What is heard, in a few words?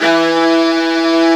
E 1, CELLO SECT; AKAI S6000 CD-ROM - Volume 1; Strings